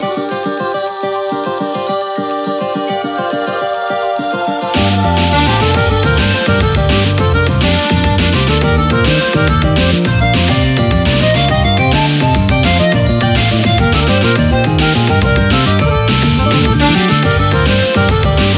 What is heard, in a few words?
Climbing loop